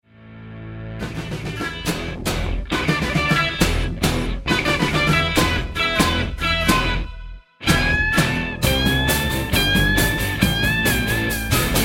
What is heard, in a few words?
Basses Drums Lead